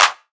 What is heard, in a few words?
short hand clap sample c key, Royality free clap one shot tuned to the C note; single hits one shots